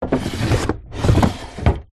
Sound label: household drawer open close bedside cabinet wooden